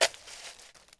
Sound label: Dig Dirt; Civ4 warlords